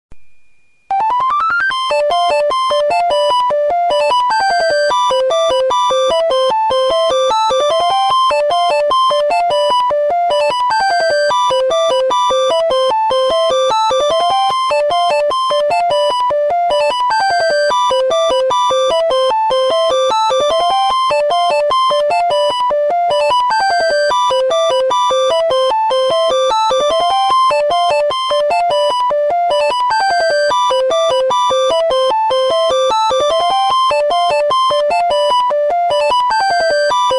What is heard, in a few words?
ringtone, BlackBerry 10